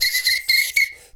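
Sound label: foudang groove; Best Service; World Colours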